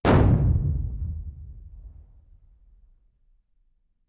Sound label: 30 Feet Primacord